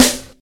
sparkly steel snare drum sample b key, acoustic snare tuned to the B note